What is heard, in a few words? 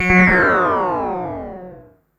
Perc Synth Moog Delay